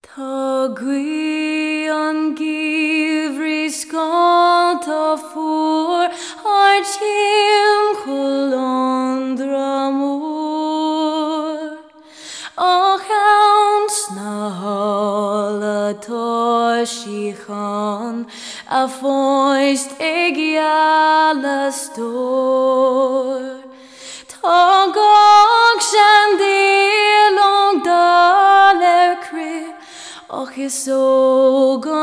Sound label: Wedding Singer